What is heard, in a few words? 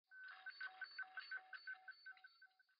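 computer room fade out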